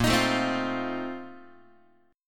A sharp Major 7th Suspended 2nd Suspended 4th A sharp Guitar; A#M7sus2sus4; strummed; JGuitar